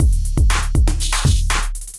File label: Electrified Kit; Electrified Kits